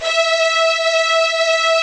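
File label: arcato string; OMI - Universe of Sounds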